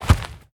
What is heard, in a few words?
Fishmen Footsteps Dirt